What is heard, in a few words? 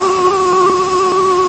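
mosquito single short close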